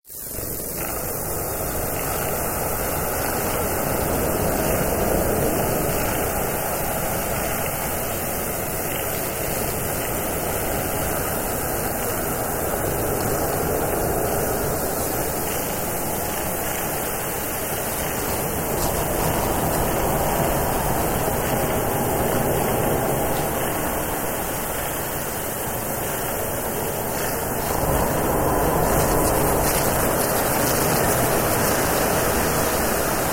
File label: Footstep